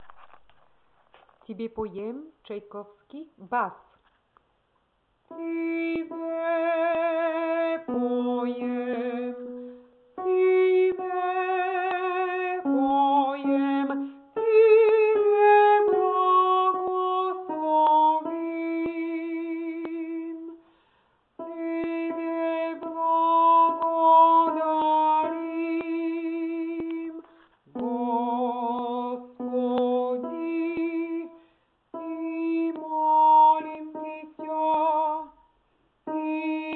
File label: bas; tebe poem